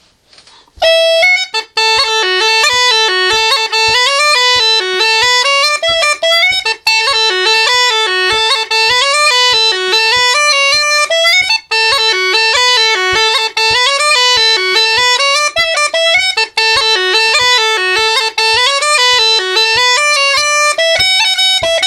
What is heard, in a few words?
Chanter, uilleann; Old Joes Jig; Charles Roberts